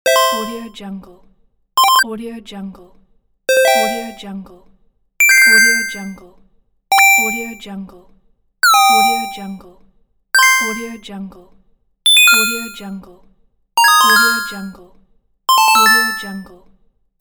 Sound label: 8bit game sound effects pack